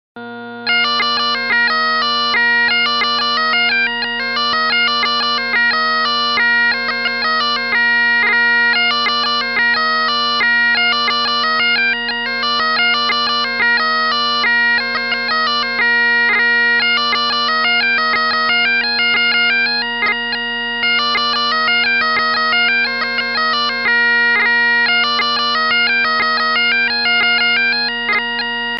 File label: Shower